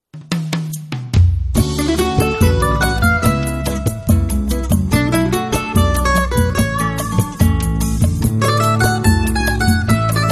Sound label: samba